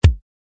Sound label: kick